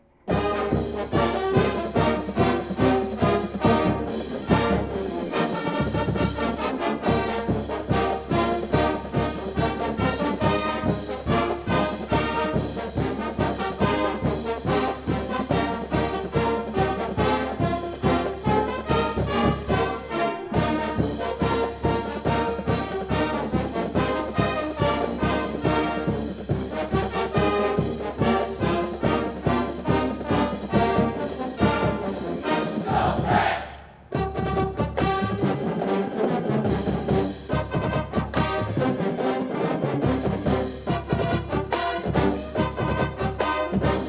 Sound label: Fight Song Recording Session Marching Band; CSU Fight Song 2020